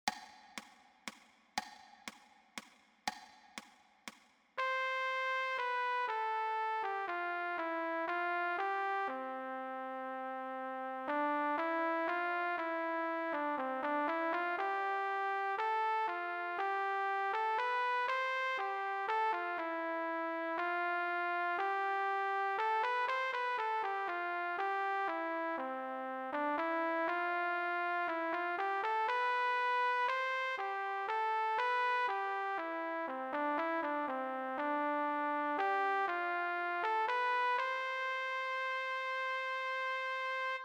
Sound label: Rhythmic Dictation; y12 sem2 exams